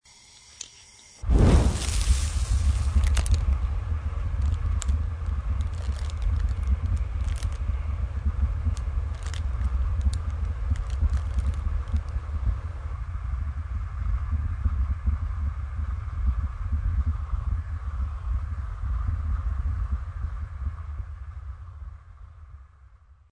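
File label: lighting a torch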